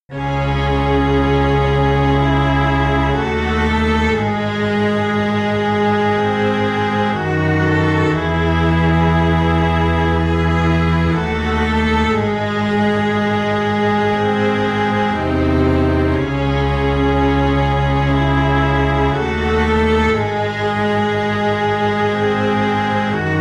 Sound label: string quartet